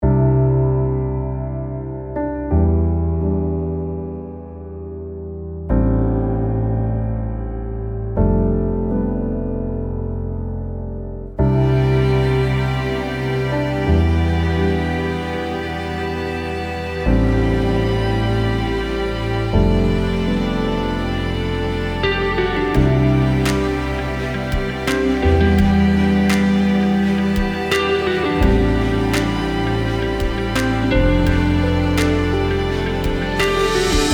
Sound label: piano neoclassical hybrid; Uplifting Piano and Modern Classical Hybrid; UBER